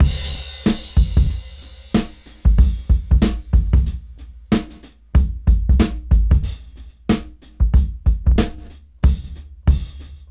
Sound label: Rap 93 BPM Dry; AMG - Keith LeBlanc Phat Butt Jams